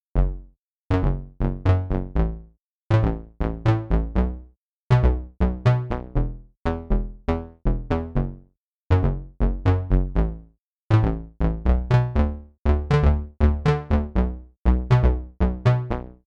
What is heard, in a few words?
junior bass